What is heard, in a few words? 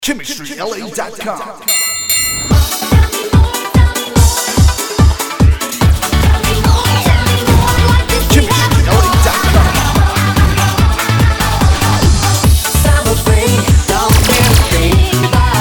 Cheer